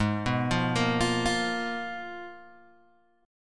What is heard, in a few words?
Ab Minor 6th Add 9th, Ab, Guitar, Open G